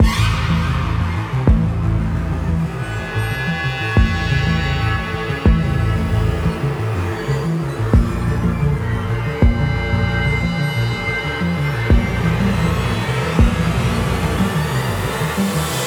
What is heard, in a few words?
BGM Threaten Intro